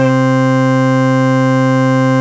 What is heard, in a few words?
FARFISA ORGAN, Vintage Timetraveler